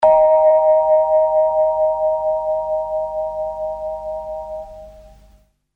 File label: bell; noviygod